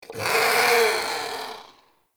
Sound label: Creature; Ambience; Corrupted Ode